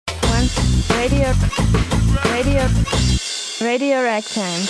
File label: one radio, jingle